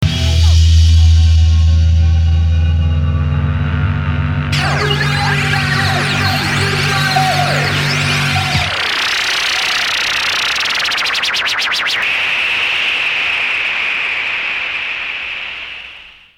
scratch

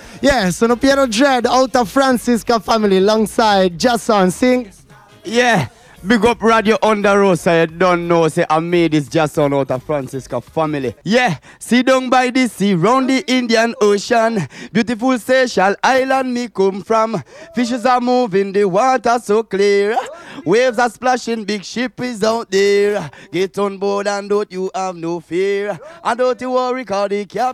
dub; radioondarossa

